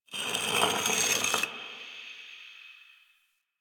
Stone Small Scratches

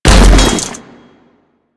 Bodyfall; Foley; Warframe; Tenno

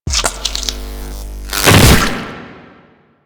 Melee Mid Spin Flesh; Warframe; Infested; Ancient

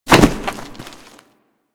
Hit Ledge; Foley; Warframe; Tenno

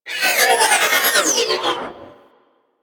Railjack Sentient Ship Roll; Warframe; Enemy Ships; Space Fighter Sentient Coil Agent

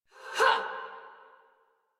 Attack Effort; Warframe; Dax; Ranged

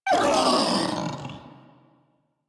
Feral Vocal Death; Enemies; Kubrow; Warframe

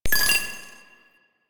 Flying Robot Beep; Warframe; Quests; Veilbreaker; Ambience; Kahl Quest

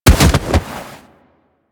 Hoverboard Ragdoll Bodyfall; Warframe; DE; Vehicles; Venus; Hit Effects

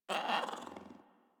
Kitten Speak Playful; Warframe; Catbrow

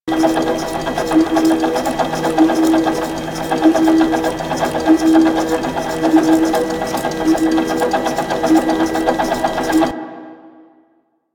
Rail Jack Ambient Machine Loop; Warframe

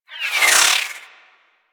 Warfan Projectiles Whizzby; Warframe; Tenno; Broken Frame

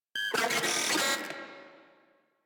Moa vocal pain, enemies; Warframe

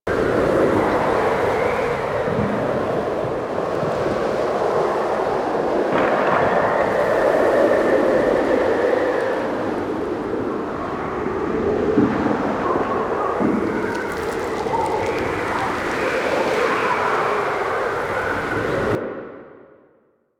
Settlement Ext Amb, Exterior

